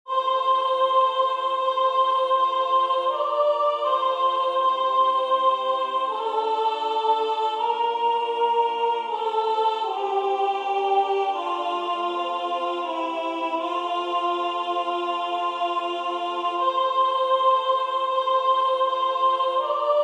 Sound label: soprano practice track; lo how a rose